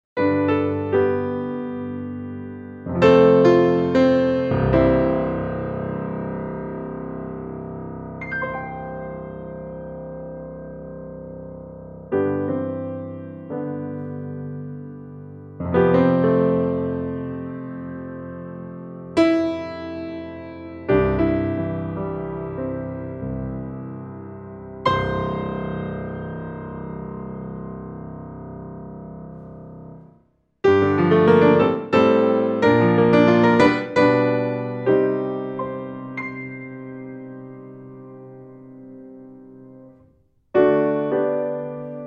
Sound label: Vienna Imperial, piano; VSL